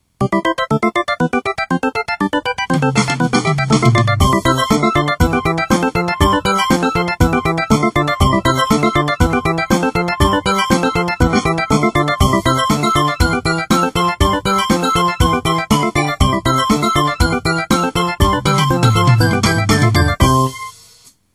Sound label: life style, phonetones; Asus P525